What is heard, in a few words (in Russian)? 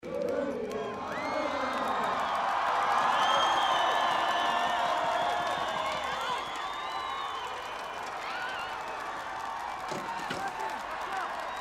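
атмосфера на трамплине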